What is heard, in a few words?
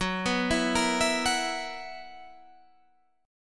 B Major 7th Suspended 4th Gb